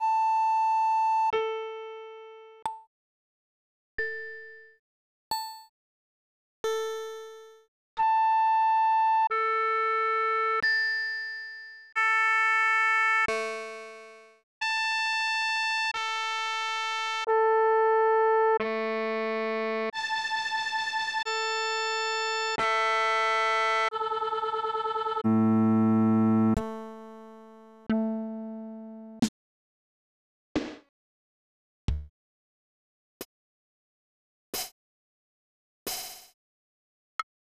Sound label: Instrument Test; Sutte Hakkun